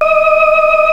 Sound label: Organ, Barton Tibias